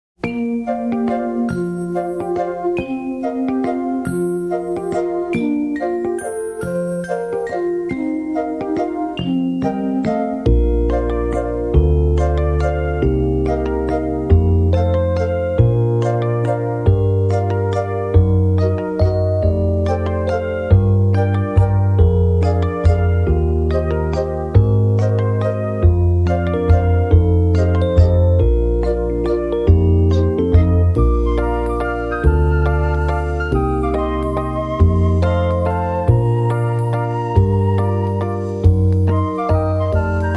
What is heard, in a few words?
Steamwhistle Waltz WITHOUT melody fade